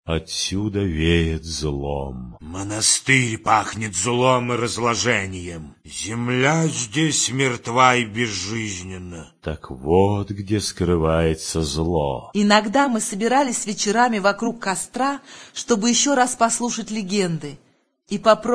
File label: halelujaskit